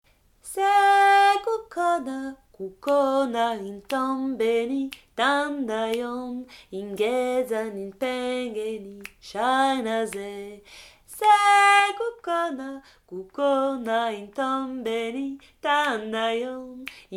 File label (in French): basse seule